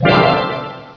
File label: START; BigMax nº 3; BAKUBAKU